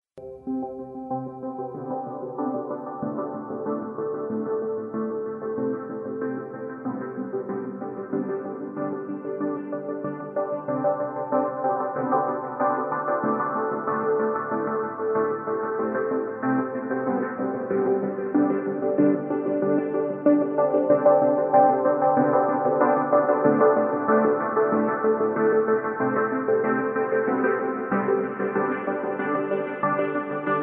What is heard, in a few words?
ringtone